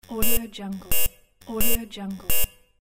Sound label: alarm